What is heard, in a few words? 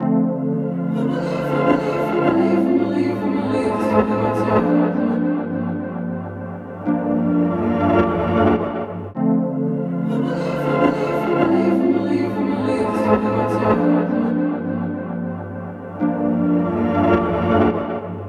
105 melodic stack lyric Dmin